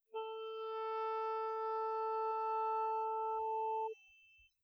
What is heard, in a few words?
saxophone; Saxofón